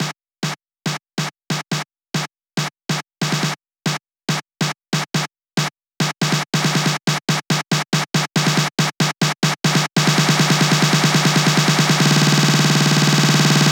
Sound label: Fills, Loops 140 BPM